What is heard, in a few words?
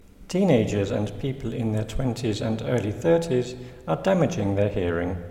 loud music